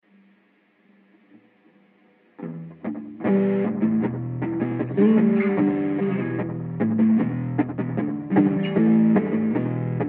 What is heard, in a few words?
Em150 GTR motif